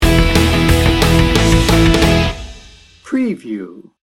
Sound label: indie star sting bumper